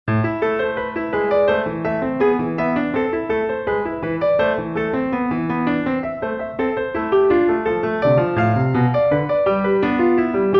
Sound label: Invention 13 A minor